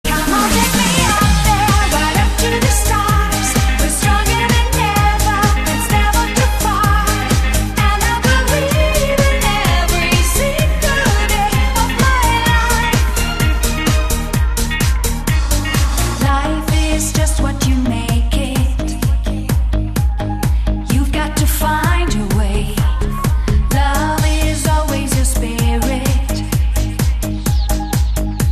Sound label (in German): Gesang Song